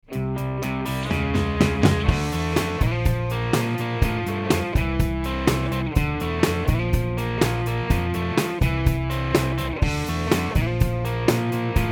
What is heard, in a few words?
Overdubbed Ensemble